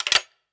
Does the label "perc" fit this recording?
Yes